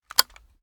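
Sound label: selector off, weapons foley; gmod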